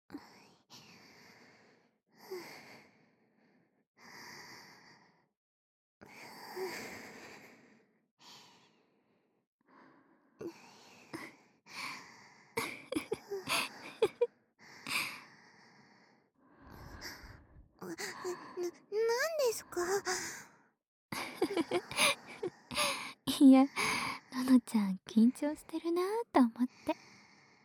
耳舐め; ASMR; 耳舐めの練習させてください！

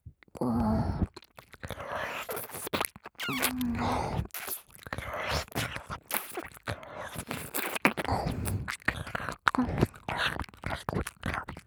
激しい耳舐め 左

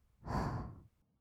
吐く音, ブレス, kawaii voice; ASMR

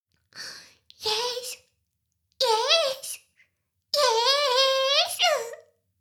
シコシコ音声; 魔法少女マスカリマッスル

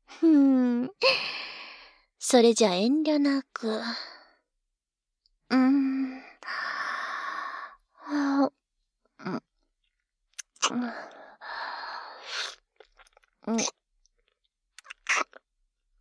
キス＆ディープキス, ASMR; シホとキス＆ディープキス; noSE

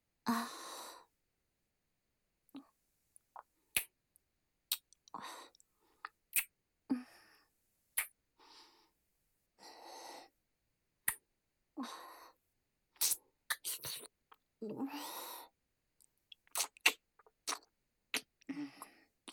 キス 接吻

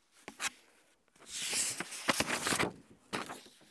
本をめくる

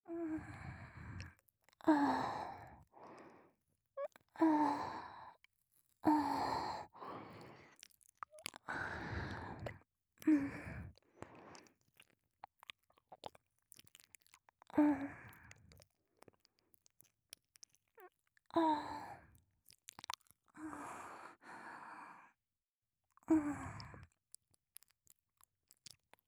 ぐっぽり 耳舐め; 射精描写あり

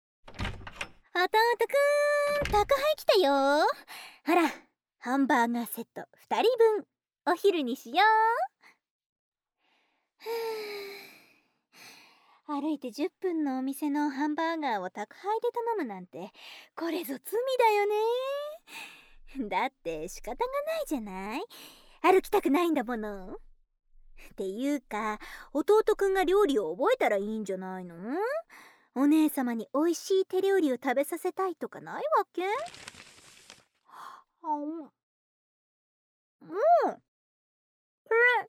ハンバーガーを食べよう; 姉と一緒にハンバーガーを食べよう

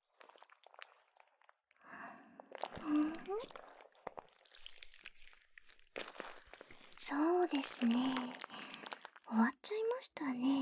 両耳触手耳かき, ASMR

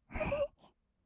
"ふふっ", kawaii voice; ASMR; 色々なワンショット; 1200 kawaii voice!!

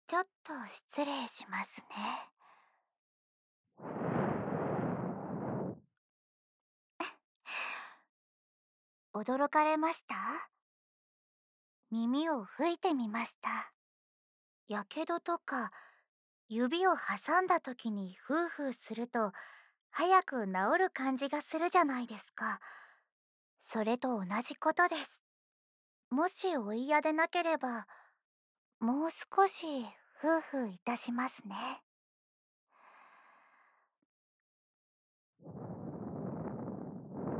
耳吹き、左耳; 天使の息吹き

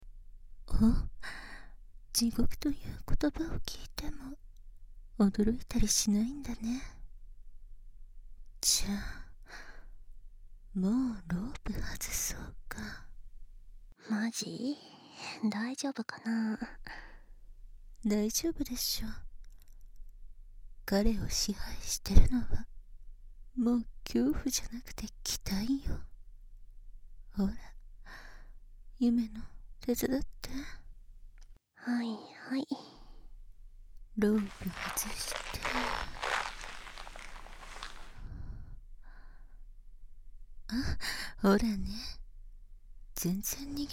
ぐっぽり耳舐め; 手コキで快楽地獄

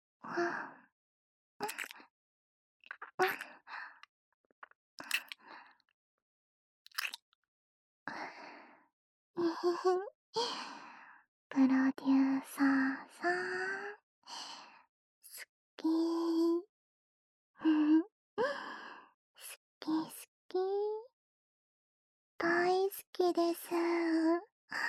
甘キスループ; Pさん！だ～い好きぃ～♪

